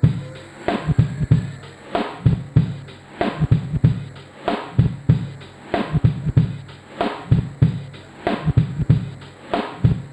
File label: Machine, Drum Loop, Yamaha DTXpress